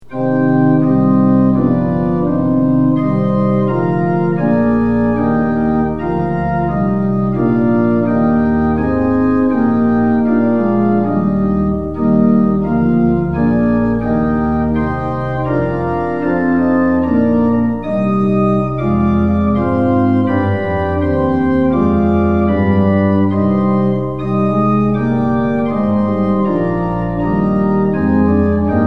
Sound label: tune; hymns; lillenas